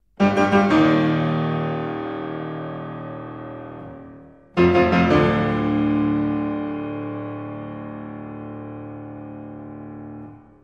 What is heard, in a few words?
Beethoven Liszt Symphony 5